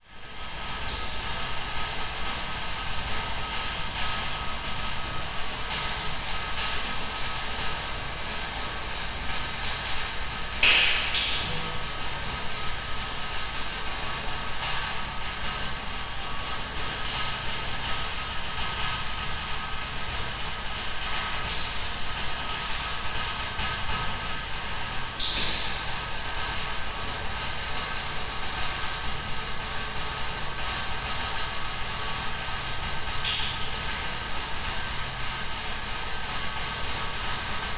nature water leak drip into large metal container reverberant internal